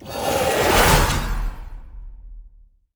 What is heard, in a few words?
enemy summon spawn